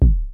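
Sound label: bass drums, synth drums; Syntec; Wall of Sounds; TECHNO-BOX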